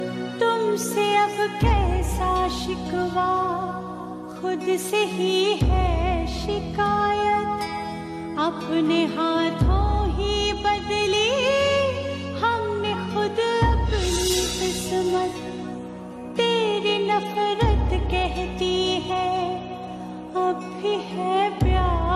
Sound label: Old Hindi Sad Song Ringtone